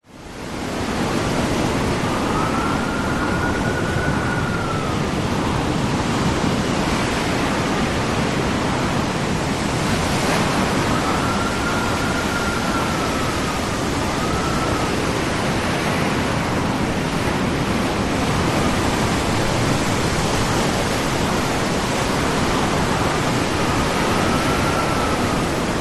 ocean storm high winds heavy large waves hurricane; nature